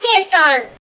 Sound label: sound of a winner kid